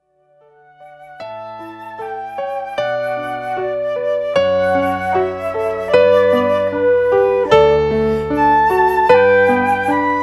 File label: Flute